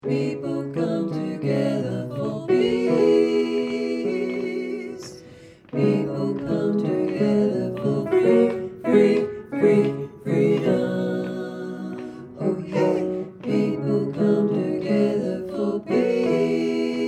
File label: all parts, voices